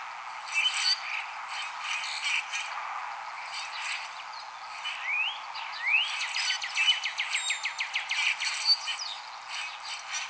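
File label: Common Grackle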